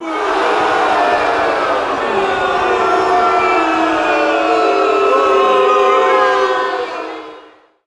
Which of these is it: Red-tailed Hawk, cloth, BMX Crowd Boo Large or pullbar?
BMX Crowd Boo Large